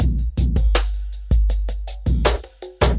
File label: 080 bpm smooth verby shake, drum loops and breaks; shakem